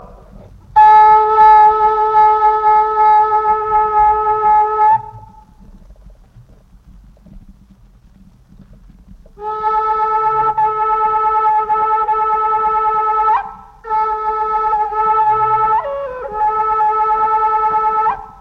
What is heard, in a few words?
kiowa flute, native